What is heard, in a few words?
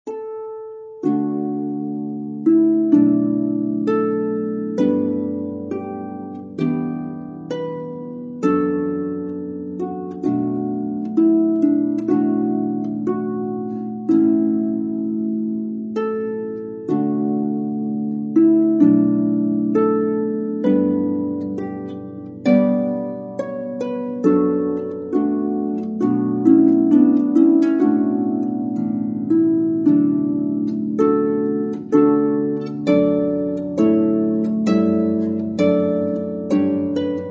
Harp Hymns